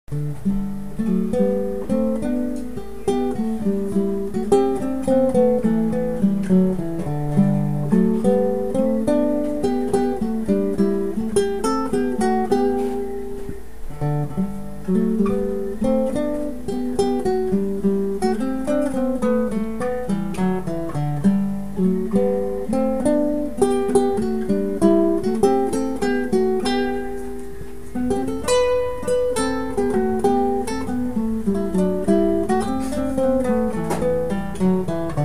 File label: noodling; shepards wife